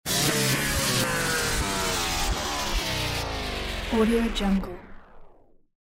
game over

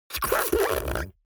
human mouth beatbox tongue roll weird; smartsoundfx

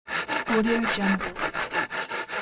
hacksaw cutting wood

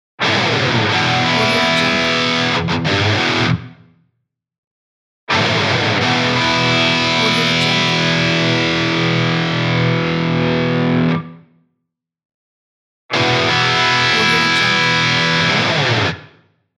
rock explosion logo

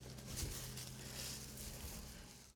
int bale toss; collanderson

